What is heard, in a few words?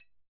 Roland MV8800 percussion